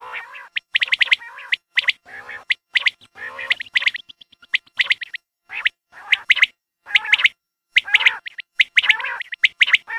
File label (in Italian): quaglie gruppo, birdsound